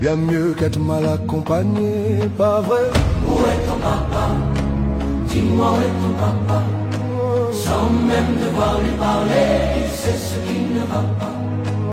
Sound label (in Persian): music, آهنگ کوتاه غمگین ایرانی